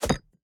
object grab bottle

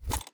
ui submenu units close

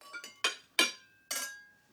ambience room butchery working layer

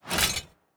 ui battle preparation

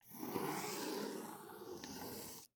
ambience room shadow room working layer

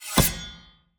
sword grab; player

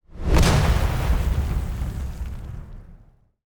Box Rocket Explosion Small; TANK; 3Souls

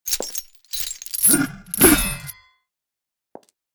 demoness strike

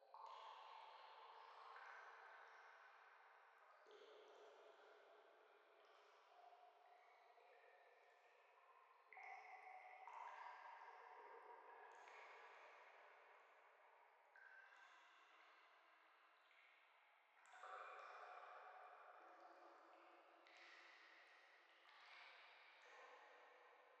ambience main ambience far water

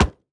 environment hammer hit

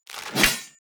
lance skeleton strike

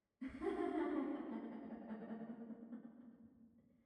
ambience room witch lodge laugh

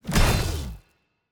Orbital Sword Hit; TANK; 3Souls

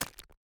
environment pickaxe hit stone

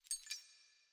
ambience room chain base

